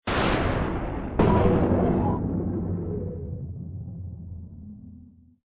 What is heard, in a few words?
jingle; Super Tetris 2